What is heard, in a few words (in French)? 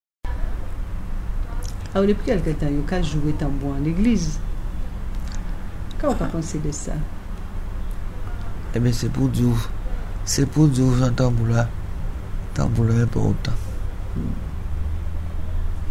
Tambour a leglise